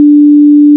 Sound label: laser; Robotron; PC Gamer 39